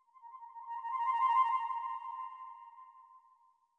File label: flute trill; Sky Children of the Light gamerip